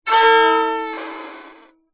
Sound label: musical violin single bow play badly